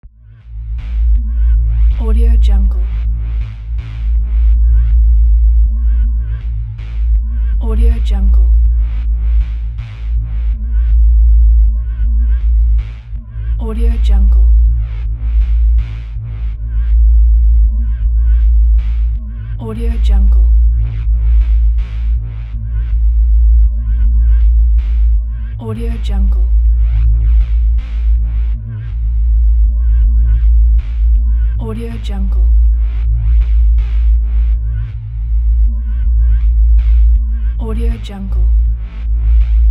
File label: cosmic deep bass drone; futuristic; پهپاد کیهانی